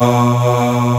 CHOIR E2; GOTHIC; East West; Global Sounds

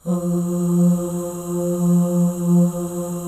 OH, FEM, Symphony of Voices; Spectrasonics

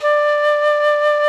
FLUTE D4; Greytsounds; Scott Peers Sound Engineering

